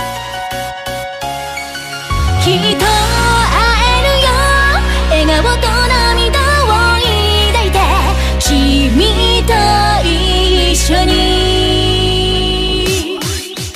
intro music; freak fortress 2

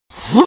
kill; freak fortress 2; some clean trash

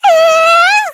superjump; ff2; javelin

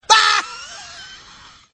teleport; freak fortress 2; hentai woody

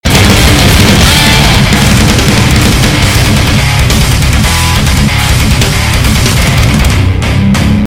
outtro music; ff2

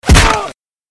hit; freak fortress 2; super pro demo

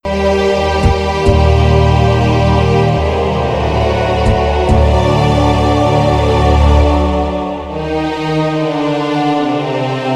intro music; xinalized; last wizard

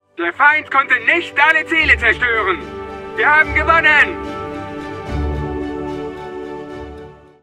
victory; angriff offizier

